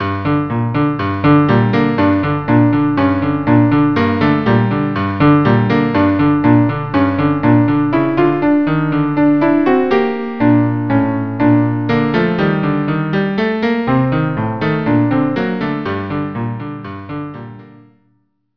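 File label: cello cello; Khosn Kale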